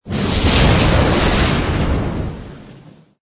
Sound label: bullet create; croods